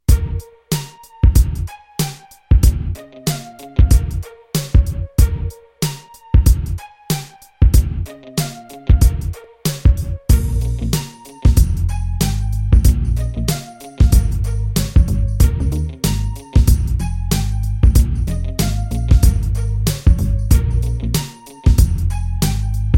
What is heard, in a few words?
beat